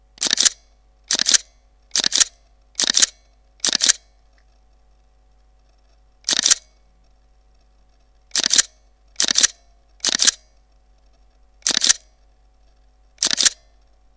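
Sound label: Pentax K200D continuous low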